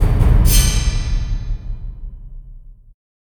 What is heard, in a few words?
fanfare item levelup; Spiral Knights